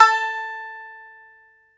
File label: BAGLAMA, Plucked Strings; E-MU Producer Series Vol. 7 – Old World Instruments